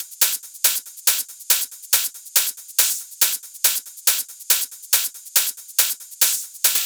140BPM Genetic Kit

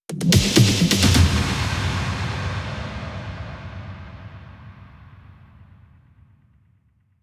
Big Fill Ins 128BPM; Vengeance Samples

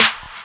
snares; Vengeance Samples

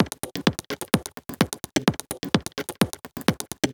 Minimal Loops

